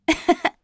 Vox Laugh; kingsraid; en

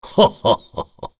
Laugh, kr; Dakaris; kingsraid

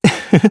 Laugh, jp; Zafir; Kings Raid

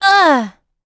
Vox Damage; kingsraid; en

